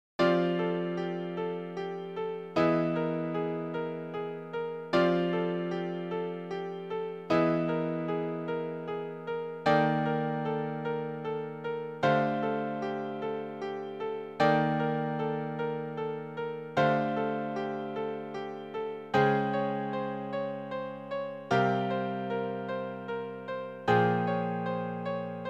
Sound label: bell into thin air